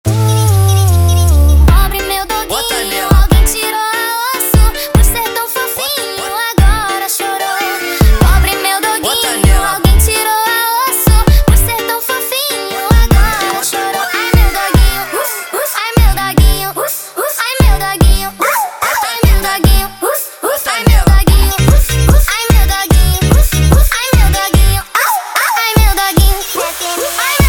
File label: Montagem sped Up